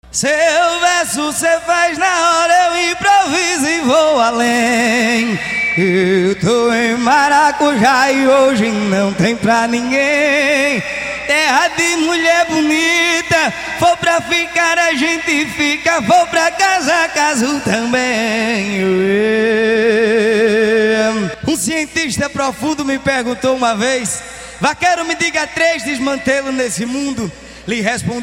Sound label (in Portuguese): toada